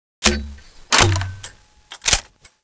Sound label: law draw, weapons; cstrike